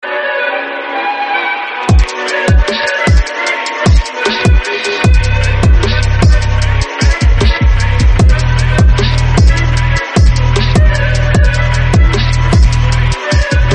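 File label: phonk, bgm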